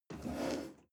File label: chair wood take slide medium